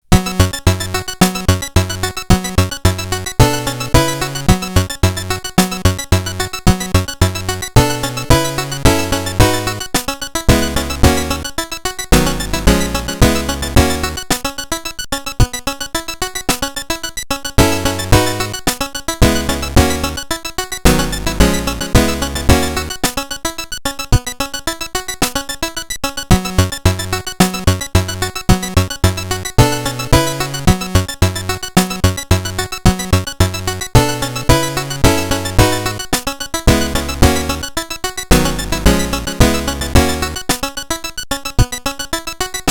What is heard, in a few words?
Guild (Chiptune version); The Dark Spire Original Soundtrack 2023